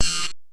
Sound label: scan; physioex9